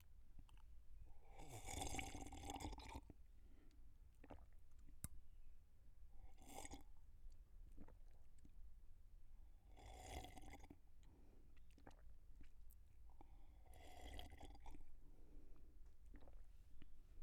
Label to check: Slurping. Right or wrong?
Right